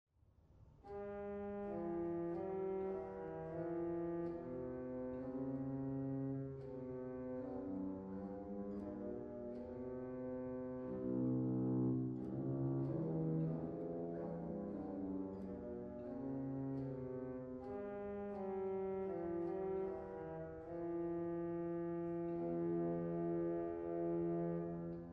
Cello 8, Sauer; dortmund

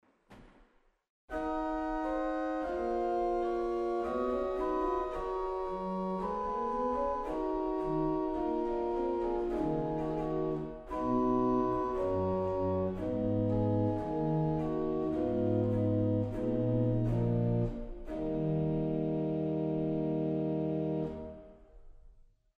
Gemshorn 8; waltershausen

